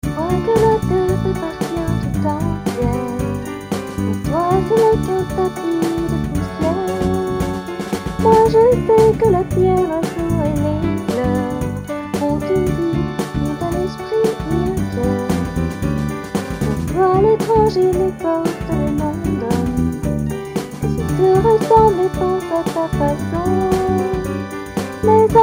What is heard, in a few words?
Uplifting; Projet Songsmith